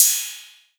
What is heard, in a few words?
Cymbal Short TR808; pitchglitch